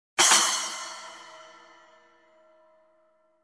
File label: Double splash hit